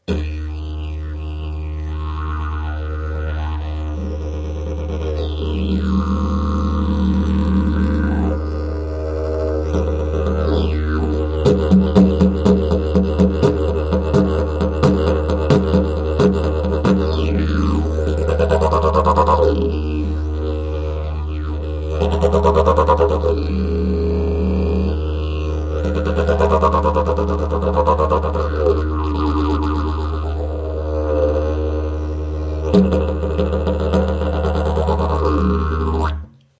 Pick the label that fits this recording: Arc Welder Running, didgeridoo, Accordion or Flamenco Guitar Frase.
didgeridoo